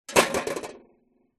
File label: household tin lid drop close vibrate; zapsplat